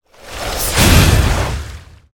attack; hero

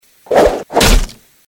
attack; hero sound

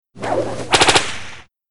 attack; hero sound

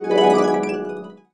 skill music magic, battle

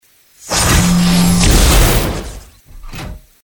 attack; hero

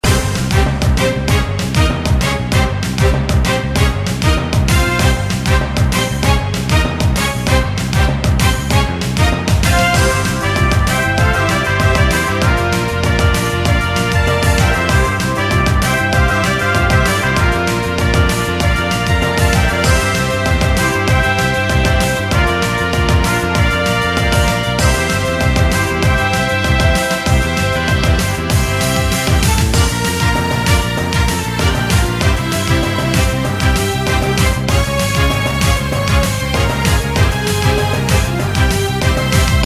battle

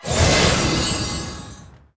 skill magic

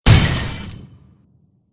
bing

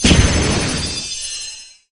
magic, battle

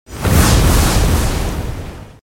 skill firestorm

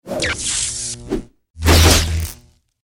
attack; hero

